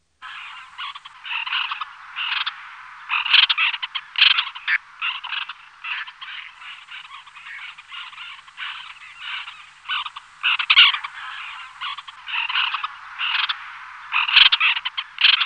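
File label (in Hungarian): Fehérszárnyú szerkő; Madarak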